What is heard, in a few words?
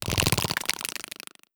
idle clicks, headcrab black; creatures; vox